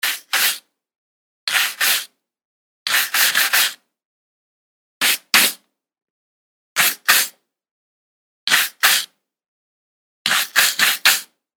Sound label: Brushing